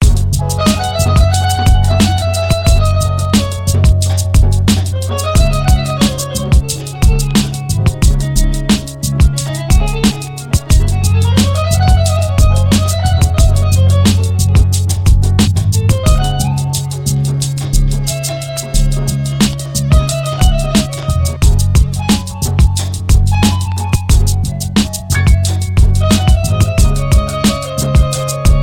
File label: beat